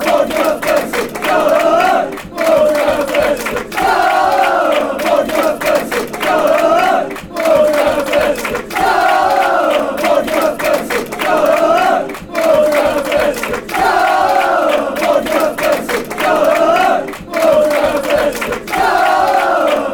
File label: blackburn chant